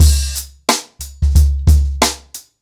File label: Ripple Effect 90BPM, acoustic drums; The Roots Drumloops